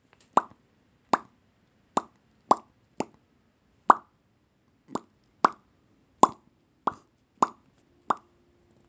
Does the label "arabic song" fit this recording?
No